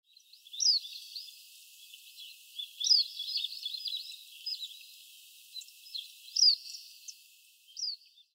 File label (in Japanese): omashiko, tottori